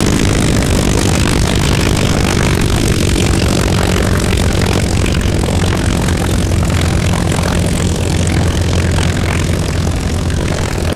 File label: vintage propeller plane engine decelerating; aircraft